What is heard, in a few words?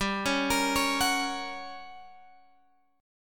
G Minor Major 7th Flat 5th G, Guitar Open G; chordshape; down